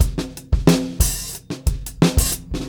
089, Beat Loops; Zero-G - Monster Beats

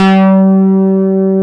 COMP G G3, SYNTHESIZER; GLUNGE

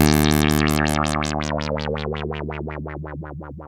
2600 D2, ARP 2600 Synthesizer; Hollywood Edge - Giorgio Moroder Rare Synthesizer Collection

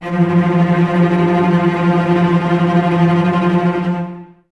F 3, String Ensembles Cellos Tremolo; Miroslav Vitous

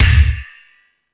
Thundadome, E-MU Pro-Cussion

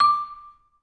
VB MUTE D5; Best Service; Peter Siedlaczek's Advanced Orchestra

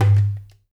DUMBEK, ETHNIC DRUMS; Spectrasonics; Liquid Grooves 1 & 2

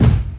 BD hip, Dance Mega Drums; Best Service

